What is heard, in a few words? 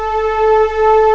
HISTRNG, CMI Factory